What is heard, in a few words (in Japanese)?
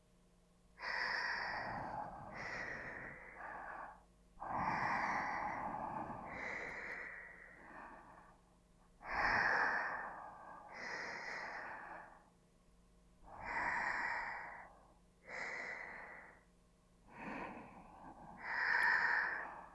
寝息; 深雪